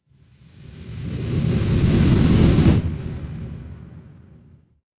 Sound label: fire electric whoosh flame